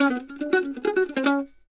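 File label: Country Mandolin (Ending); Digidesign; Pro Tools Essential Loops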